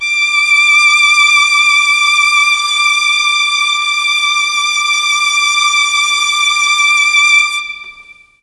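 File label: D4 violins, mellotron; CHMB 3 VLNS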